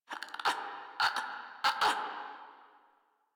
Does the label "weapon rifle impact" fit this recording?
No